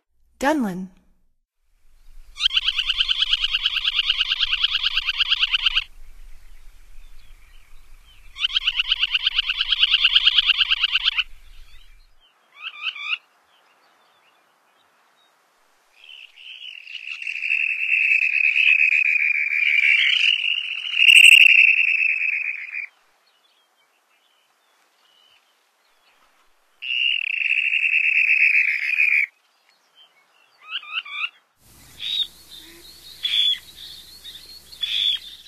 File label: Dunlin; North America; Stokes